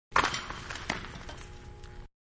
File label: Volleyball Ball into net, Volleyball Indoors; Miscellaneous Sports; Manhattan Production Music